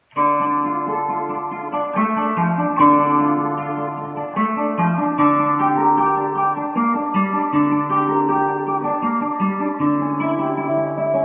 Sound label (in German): Prelude Gitarrenversion; Prelude von J.S. Bach